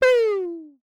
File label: powerup